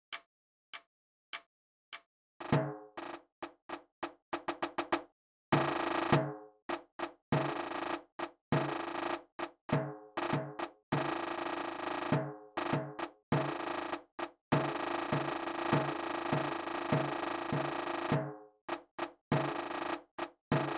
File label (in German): Rhythmus; Muss i' denn